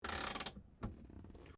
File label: CAR HOLDEN HD PREMIER HANDBREAK ON, Classic Australian cars; Holden HD Premier 1965; Cars (Sound Effects:Vehicles)